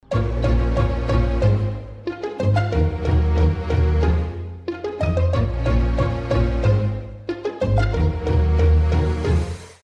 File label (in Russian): chudesnaia melodiia, смс